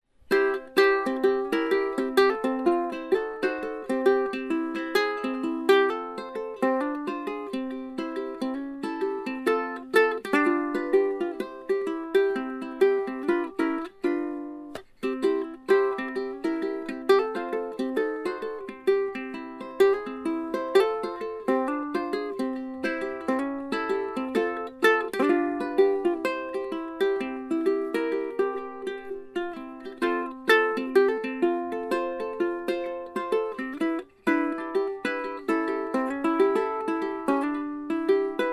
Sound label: Beltona Concert High Country Cone, resonator; Delta RC